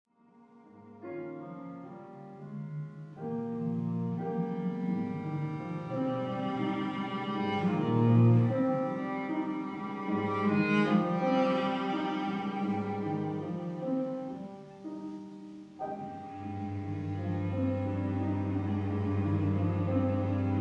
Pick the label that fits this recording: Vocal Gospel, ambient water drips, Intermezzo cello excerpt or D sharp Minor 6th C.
Intermezzo cello excerpt